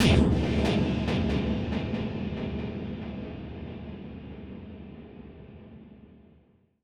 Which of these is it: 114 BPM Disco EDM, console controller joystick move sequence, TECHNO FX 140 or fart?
TECHNO FX 140